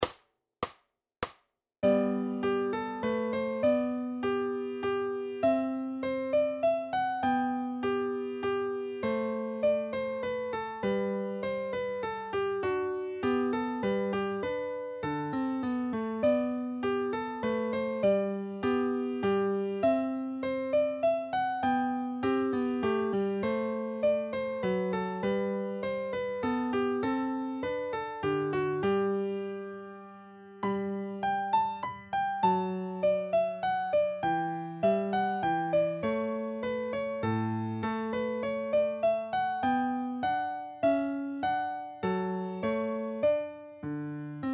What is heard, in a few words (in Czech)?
Menuet v G